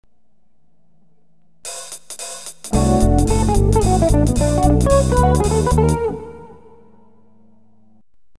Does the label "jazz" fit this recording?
Yes